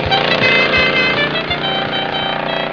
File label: horn